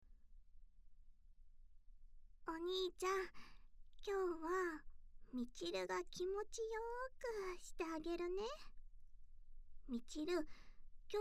囁き耳舐め, ASMR; ミチルちゃんの囁き耳舐め

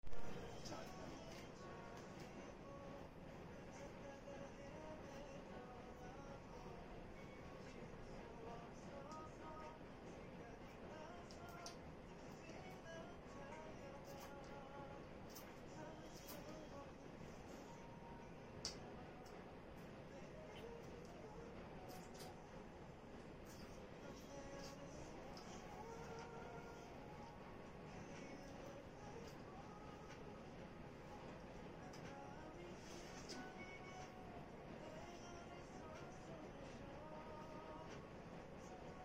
タイの女の子とオフパコ

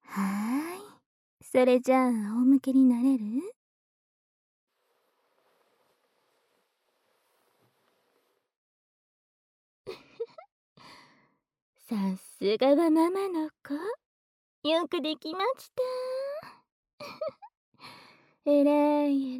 反転 頑張ったご褒美耳かき, ASMR

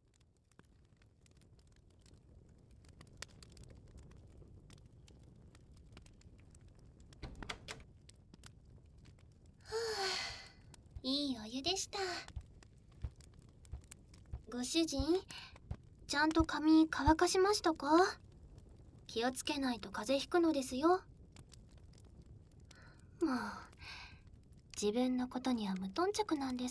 綿棒 と 耳オイル; メイドのユキさんのヒーリング耳セラピー; お風呂上がりは綿棒と耳オイルでリラックス♪

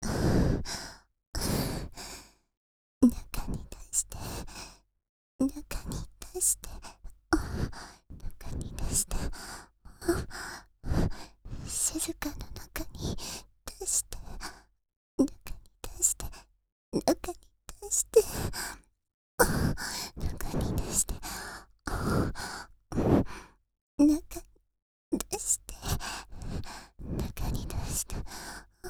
中に出して♪ 喘ぎ; 静華

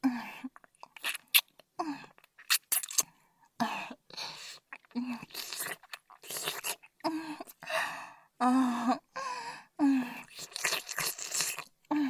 乳首舐め ＆ キス ＆ ソフトタッチ 囁き重視編集 効果音あり大きめ; 射精管理一週間

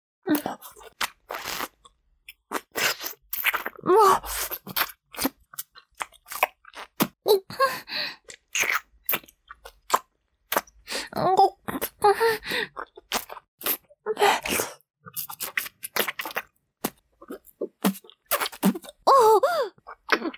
パイズリフェラ(中):陰茎を胸でしごきながら亀頭をしゃぶる; 実用ボイス抜粋集

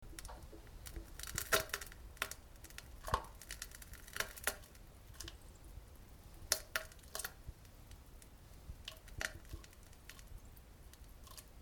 なんべん ぷぅむちゃちゃ音

